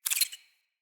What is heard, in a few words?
Amb Corrupted Critter Close; Ambience; Mendicant Area